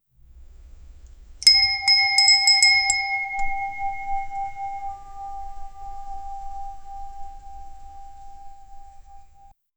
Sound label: the faint toll of a, church